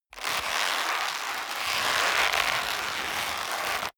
leather creak long hard

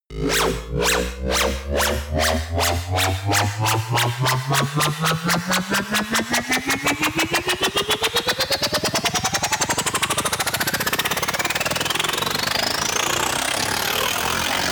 dubstep wobble

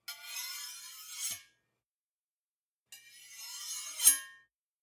two knives large scrape

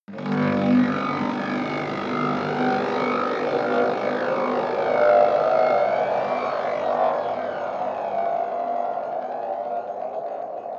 sci-fi machinery

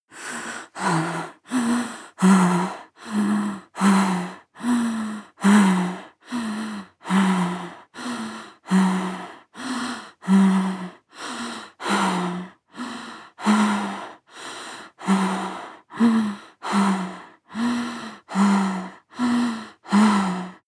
breath female moaning breath sequence

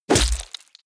heavy punch gore

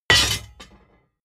plate armor piece drop heavy

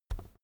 keyboard external key push soft

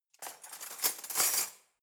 cutlery knives drawer place

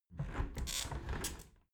window close soft sequence

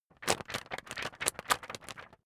magazine wobble hard

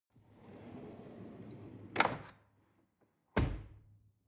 drawer cutlery close draw

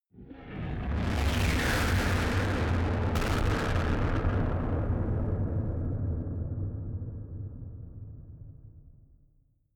distorted blasts

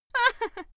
female laugh short

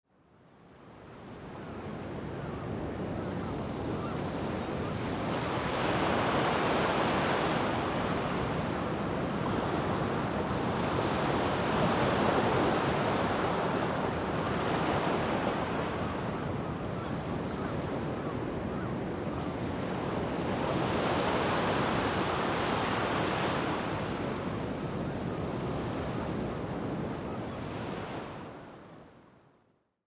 ocean cove in washington

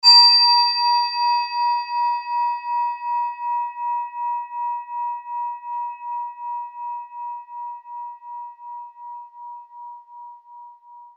japan rin bowl beated

